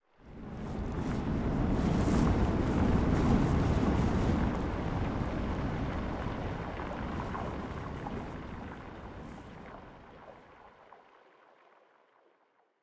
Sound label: victory surf; smite; pele